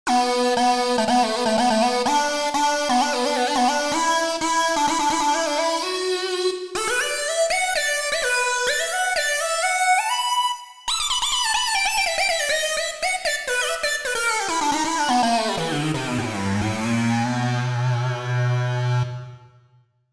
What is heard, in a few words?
Lead; Roland SRX-07 Ultimate Keys